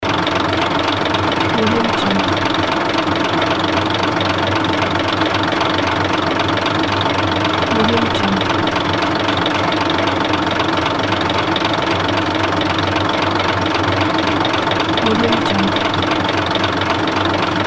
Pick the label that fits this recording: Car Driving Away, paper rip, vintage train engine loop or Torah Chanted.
vintage train engine loop